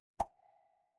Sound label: cartoon pop